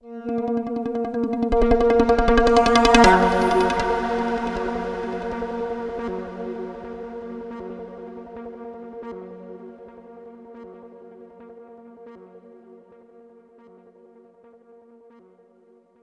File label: Tone